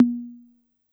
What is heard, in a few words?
Analog Tom, Yamaha PSR-E323